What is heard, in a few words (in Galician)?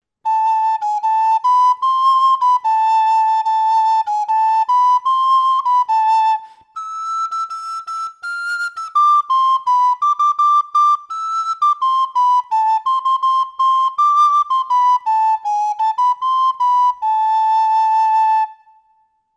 trad, Pito Galego